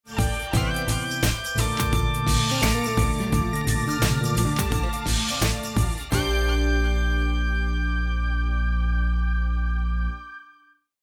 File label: bomb ten sec count; music kits custom